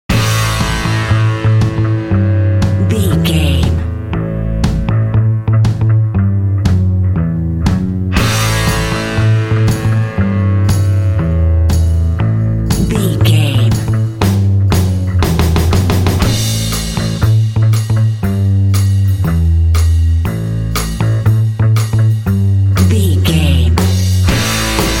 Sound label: noise god mix